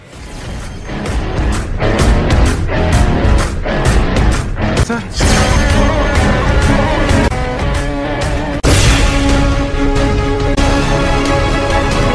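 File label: background music Ringtone; Mission Mangal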